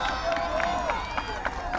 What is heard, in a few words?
Crowd Cheer